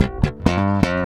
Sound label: REAL BASS, BASSLICKS; Ueberschall; Jam Box